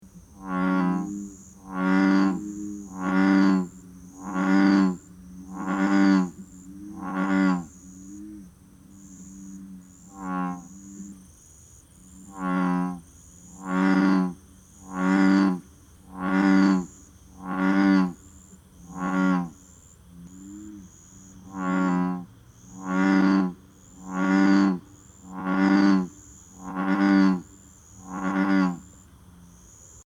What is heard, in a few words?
Lithobates Catesbeianus Is Calling